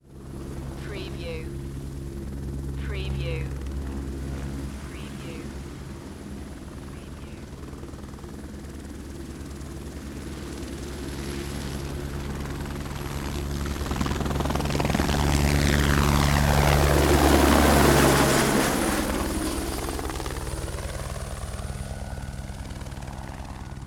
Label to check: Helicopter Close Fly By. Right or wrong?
Right